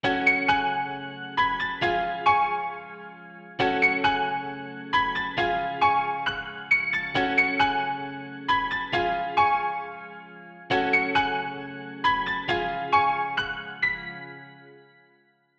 High 135bpm F#min; Chords, Hip Hop, Piano, Trap; Loop; Keys; Melody

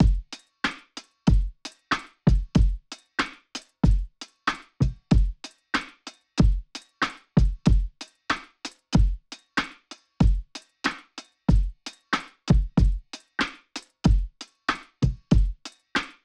Breakdance Drums 94bpm

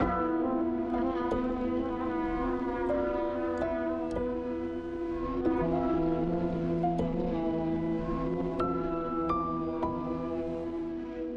Creepy Melody 168bpm Amin

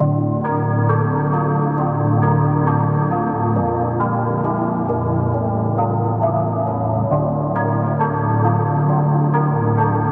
Melody Dm 135

